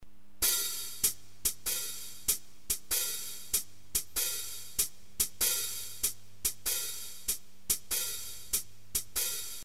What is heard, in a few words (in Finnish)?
kassaatsa hihat; Foksi; Nelijakoinen; Kolmimuunteinen